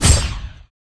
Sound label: SUM SHOT